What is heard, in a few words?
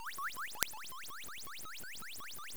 hivemind pulse; Station-0